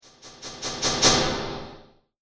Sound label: Magic Pump Spell; Magic Sound Effects; Role Playing Game; Andrew Fleming